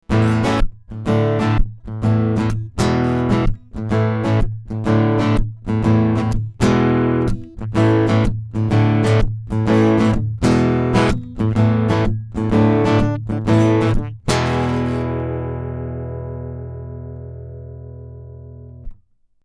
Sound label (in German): Akku Git Tube